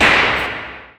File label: hell dug